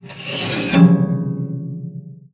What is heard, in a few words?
SPINET; Xsample VOL-7